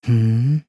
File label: hmmm; nagito; drae